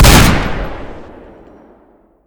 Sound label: car fire; deluge; weapons v3